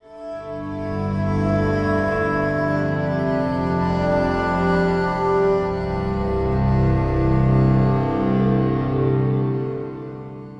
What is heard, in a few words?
Dark Prana, Acoustic Drone; Sonic Foundry (Sony Creative Software) - Friendly Fire; DroneLoop